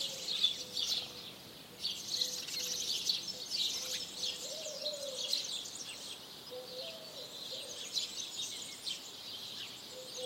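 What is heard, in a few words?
charming beautiful forest birdsong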